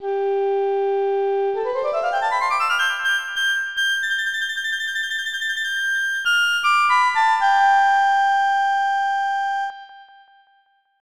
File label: Fairy Flute; Dragon Quest III